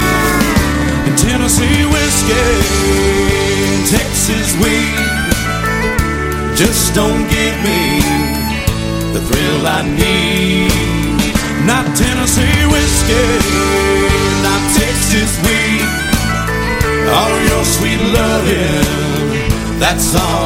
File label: Shhh